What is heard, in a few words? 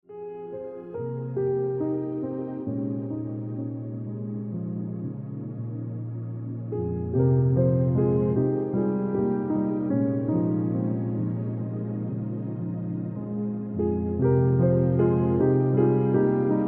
Piano Music